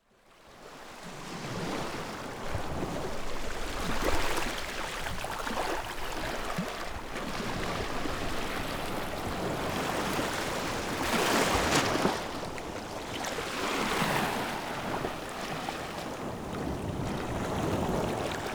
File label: LIQUIDS - Ocean Water Movement Close; Game Audio Factory; Liquids Materials